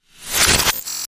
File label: Sharp Modulated Sweep